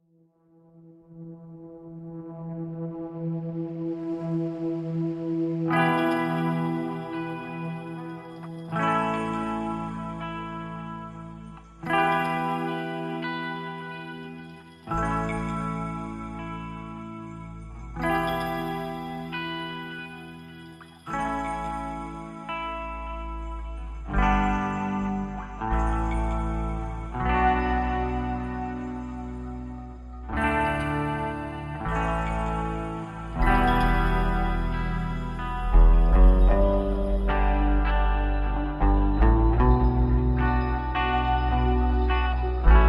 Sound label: Guitar Party